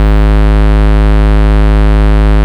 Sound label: Root G#, Bass; Vengeance Samples